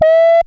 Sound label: COMPUTA TONE; Zero-G Ambient 1; COMPUTACHATA